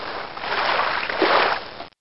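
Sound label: walk water; DAoC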